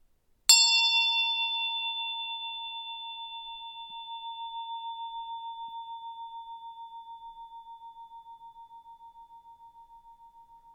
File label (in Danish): Klokke XL moerk, klokke